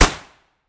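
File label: weapon ar suppressed fire player atmo interior; gmod; viper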